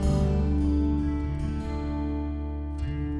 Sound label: Picking G